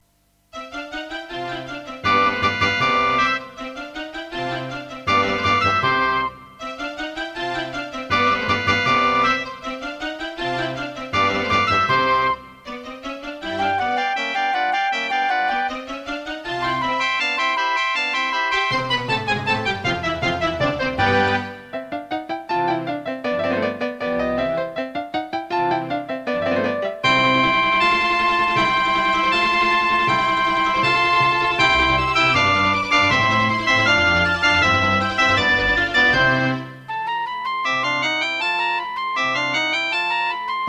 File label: Concerto partial, Serdaco Dreamblaster S1; classical; Mozart; Concerto K467 partial